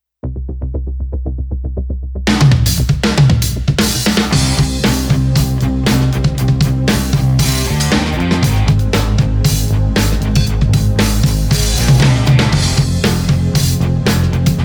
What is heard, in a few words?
Backtrack